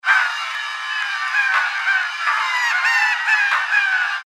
seagulls alone; amore&guerra(2006)

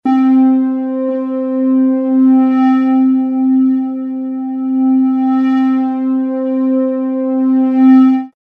finale synth solo LFO; amore&guerra(2006)

